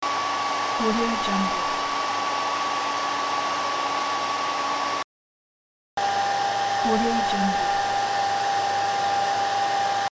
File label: hair dryer; inspectorj